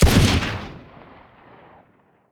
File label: Shotgun; Rogue-Like In C# Programing & Unity 2D Game Development 2022